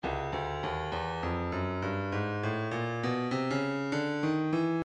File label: stylus percussion midi files cabasa 100 cabasa